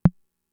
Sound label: Tom, Fricke MFB-522